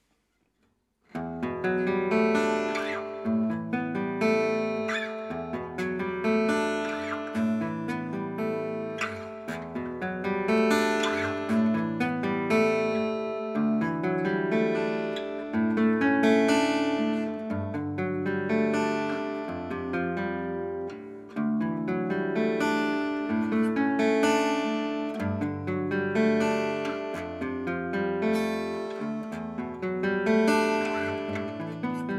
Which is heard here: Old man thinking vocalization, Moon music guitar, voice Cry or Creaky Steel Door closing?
Moon music guitar